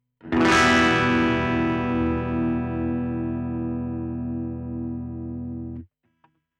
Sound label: AMP TWIN MEDIUM GAIN; Boss SY-1000 Guitar Synthesizer; SY1000 TWIN COMBO MEDIUM GAIN; Laney TT50 effect send over-driving the SY-1000 effect return